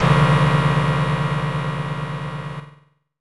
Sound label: Shock; Estpolis Denki 2; Lufia 2 Rise of the Sinistrals; snes